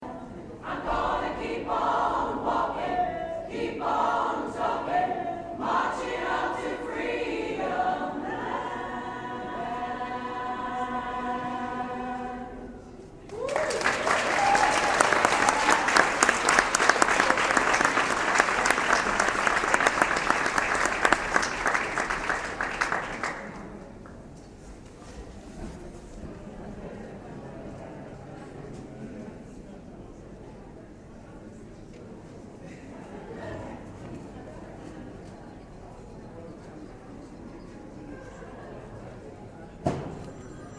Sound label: gospel singers Barbican Centre London